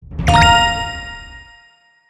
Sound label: Pop Up